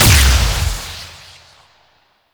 Fire Medium; Weapons; Warframe; Duviri; Incarnon Adapters

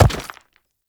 Step Rock; Warframe; Uriel; Movement; Steps

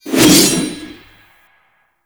Berserker Barrage Equip B; Warframe; Warframes

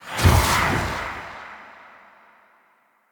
Hallow Spirit Energy Cast; Halloween

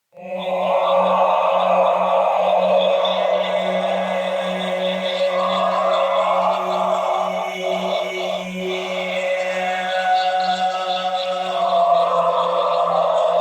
Choir; Warframe; Sentients

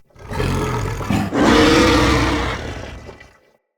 Corrupted Battle Dog Enemy Spotted; Animals; Vocals

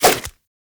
Nunchuck End Whoosh; Weapons; Warframe; Infested

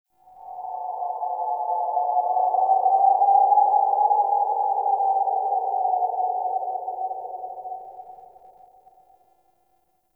Forest Cave Howl; Ambience; Warframe; Grineer

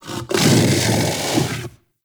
Kubrow Armored Vocal Breath Walk; Warframe

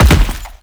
Land Heavy Default; Enemies; Footsteps; Warframe; Teshin

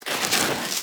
Armadillo Roll Snow; Warframe; Characters; Hunting Critters

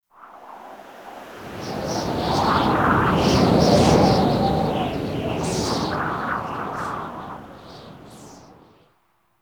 Exterior Wind Gust; Ambience; Duviri

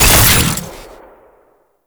Alt Shot; weapons; Warframe; Fulmin Prime

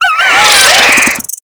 Infested Biped Death; Warframe

